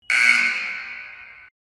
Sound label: start buzz